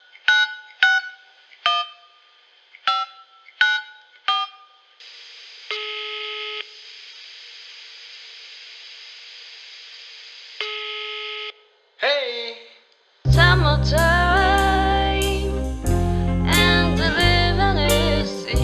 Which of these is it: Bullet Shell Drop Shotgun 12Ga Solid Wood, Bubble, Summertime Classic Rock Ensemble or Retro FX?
Summertime Classic Rock Ensemble